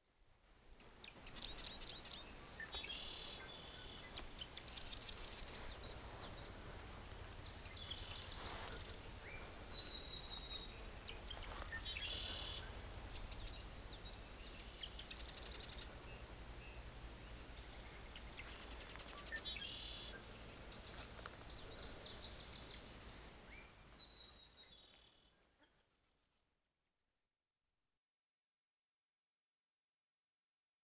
Birds Frogs Water Ambients, Olofonia

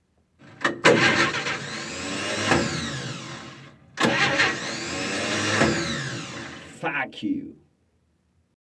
Start Car; L'olofonia è una tecnica di registrazione e riproduzione audio eseguita tramite uno specia

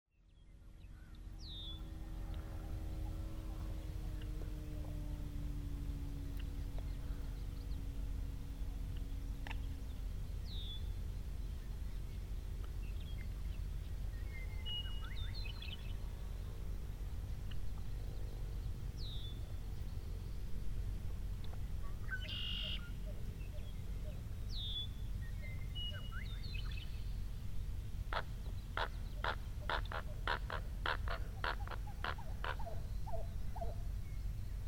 Mid Afternoon birds, Olofonia; Platt I

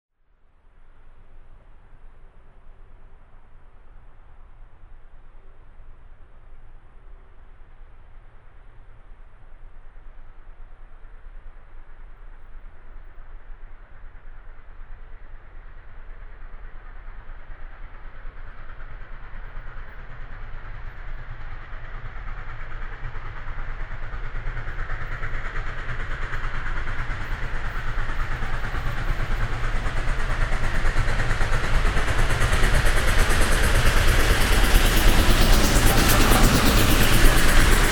CP Steam Locomotive; olofonia; 2816

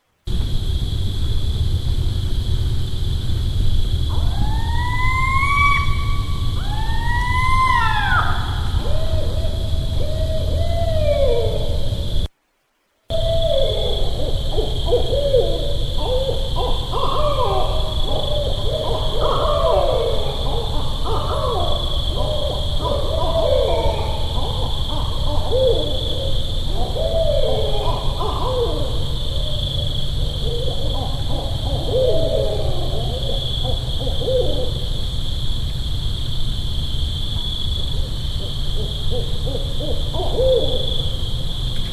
Barred Owl, olofonia

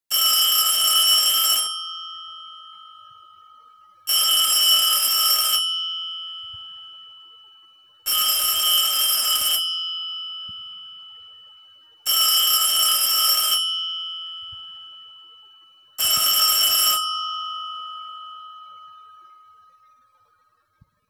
black rotary; suonerie

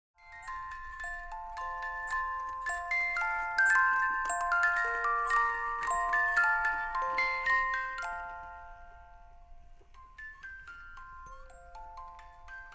Carillon, olofonia; L'olofonia è una tecnica di registrazione e riproduzione audio eseguita tramite uno specia

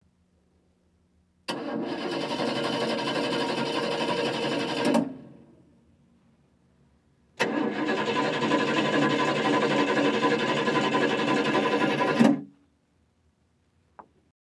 Start Old Car, Olofonia

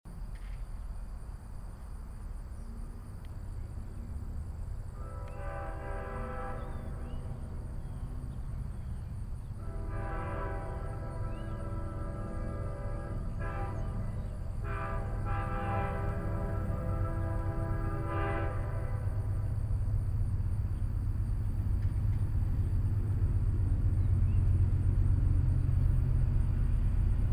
Amtrak, olofonia

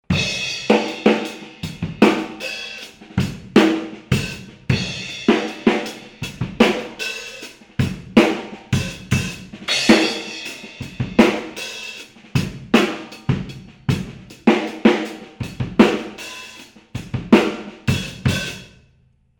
Drum tempo, Olofonia

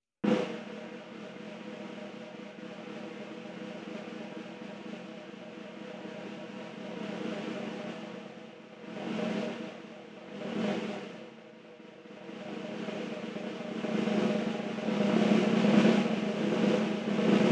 Circular Snare, Olofonia

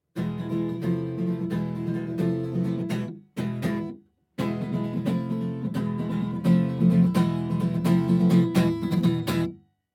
Spanish Guitar, Olofonia

